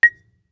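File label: Marimba deadstroke fortissimo Bb6; MIS Pitches - 2014; Percussion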